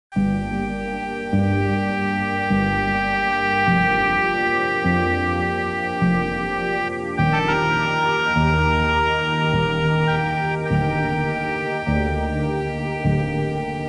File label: Dawn Chorus